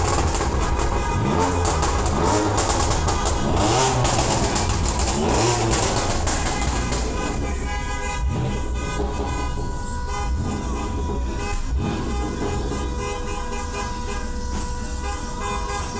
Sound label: foley